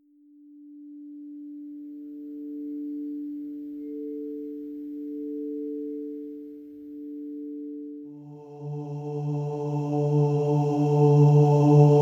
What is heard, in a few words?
Sacral Chakra C